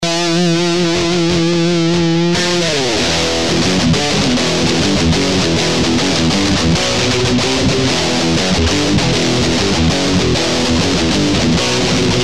sansamp california

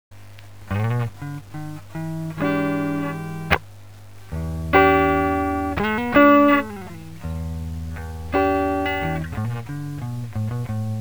MJCM FT clean; Marshall JCM800/Fender Twin

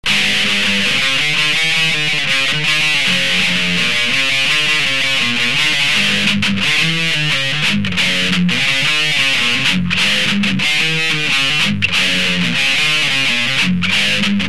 turbodrive heavy

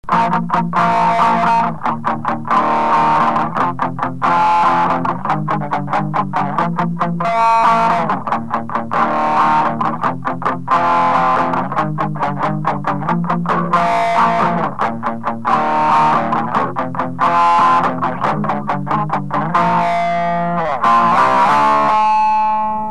turbodrive light

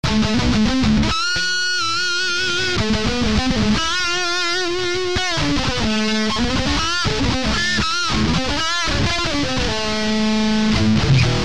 engl lead

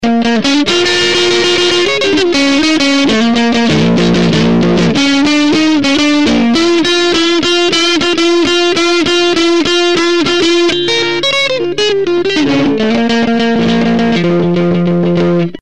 sansamp tweed

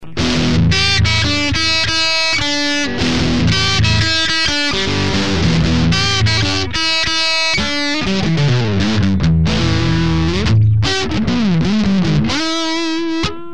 engl crunch

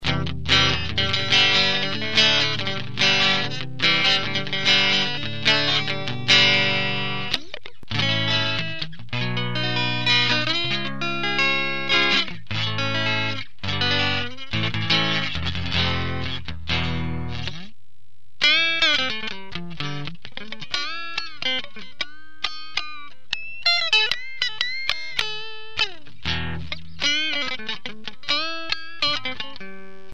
engl clean

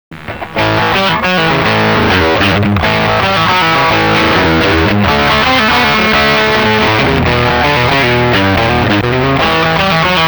higain

